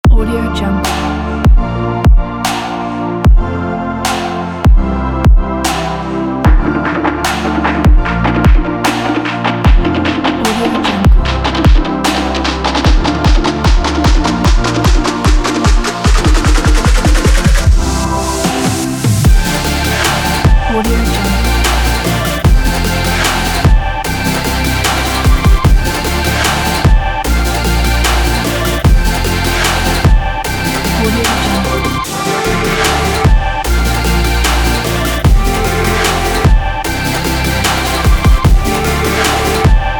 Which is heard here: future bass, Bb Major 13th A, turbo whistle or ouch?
future bass